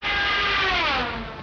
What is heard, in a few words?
airplane, Vehicles